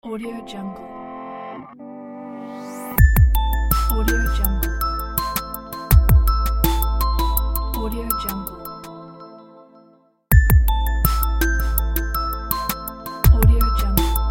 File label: digital logo